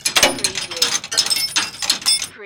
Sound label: Chain Metal Clanging